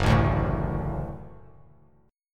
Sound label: Gb Minor Sharp 5th Gb; strummed; JGuitar